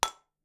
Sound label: glass; Phasmophobia